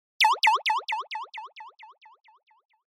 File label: Motion Pop